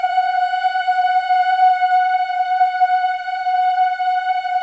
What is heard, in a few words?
BOYS EES, Symphony of Voices; Spectrasonics